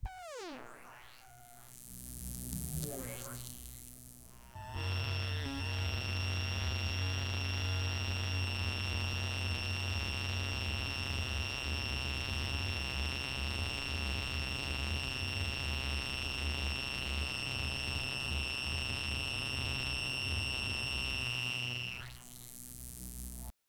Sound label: sci-fi